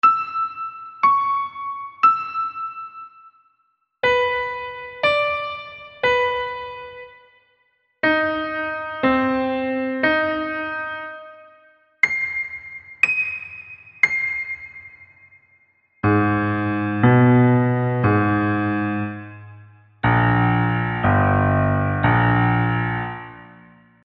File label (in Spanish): Ejercicios de Intervalos consecutivos de tercera menor ascendente y descendente